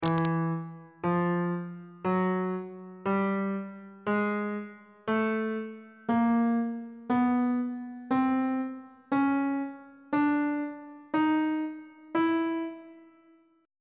d double sharp chromatic scale 1 octave of notes on bass clef